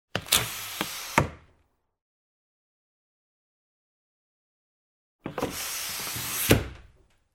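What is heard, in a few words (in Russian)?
звуковой эффект автобусной машины с закрытыми окнами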